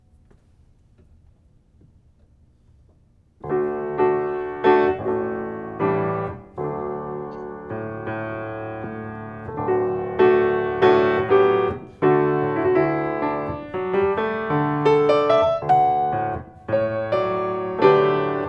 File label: D tango 77bpm slow; Albeniz